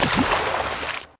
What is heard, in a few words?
splash; terrain